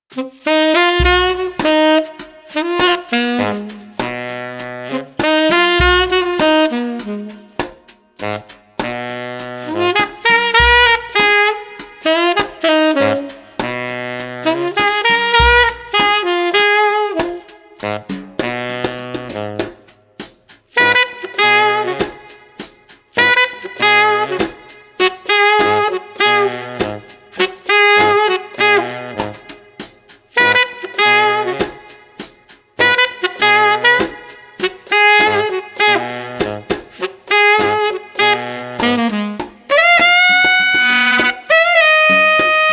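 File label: Street Sax Combo Chill, 100 bpm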